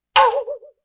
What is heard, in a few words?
WACKY